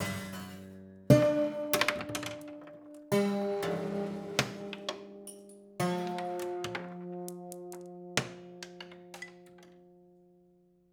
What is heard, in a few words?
Marble Lazy Bounce, Piano Destruction